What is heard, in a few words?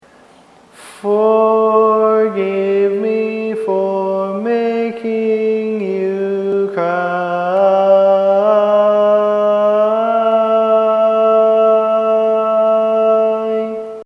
Lead, Barbershop Tags